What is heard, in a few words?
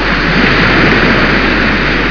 waves